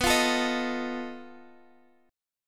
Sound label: B 7th Flat 5th B down Guitar Open G